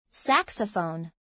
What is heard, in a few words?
saxophone